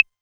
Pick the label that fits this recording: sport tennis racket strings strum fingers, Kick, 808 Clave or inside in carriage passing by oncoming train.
808 Clave